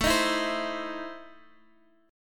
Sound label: E Major 7th Suspended 4th Sharp 5th, A